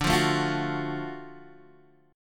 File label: Gb Minor Major 7th Sharp 5th, guitar